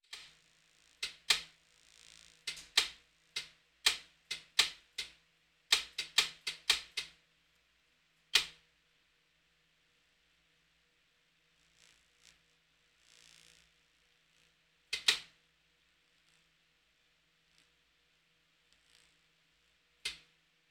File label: Hospital effects